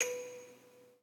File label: musicbox